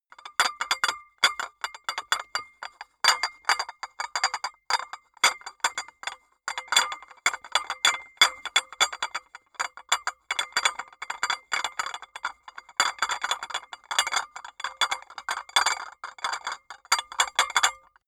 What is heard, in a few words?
dishes cups rattle jingle